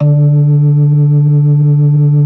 B3 D 3; Best Service; Advanced Media Trax Modern Composer; B3 LES 1

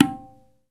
TABLA TONE, Indian Ensemble

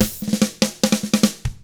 drums, 50s rock; ILIO; Double Platinum Drums 1

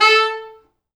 A3, Pop Brass; Best Service ProSamples vol.19; AKAI

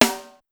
Snare, Pitched Up; Clavia DDrum-AT

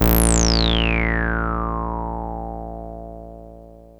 303, TB 303; Hollywood Edge; Giorgio Moroder Rare Synthesizer Collection

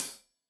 Hat Tight, Hi-Hat, Cymbals; Deeply Sampled Drums

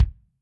KICK, Drums Percussion

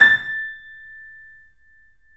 A -L, The Piano; InVision Interactive - The Piano

